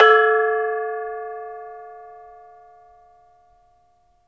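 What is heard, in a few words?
METALOPHONE, Pitched Percussion; E-MU Producer Series Vol. 7 – Old World Instruments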